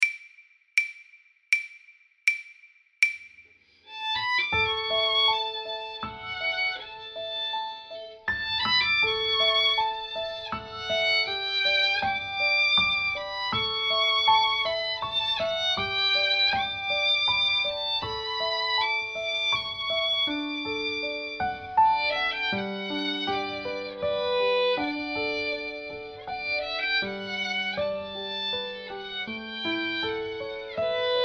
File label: Violin